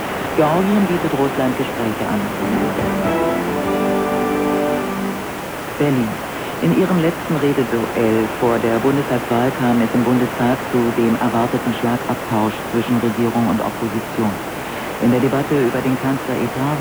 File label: radio recording